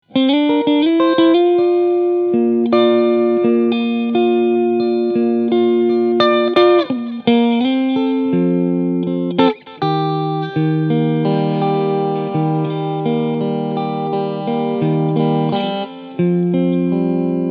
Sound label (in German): Squier Strat Cleansound MitteSteg Singlecoil; 40th Anniv